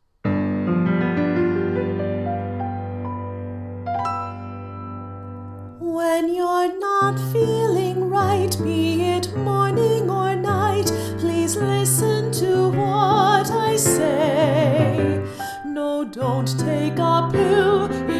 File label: Jingle; Tincture